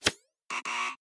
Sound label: wrong throw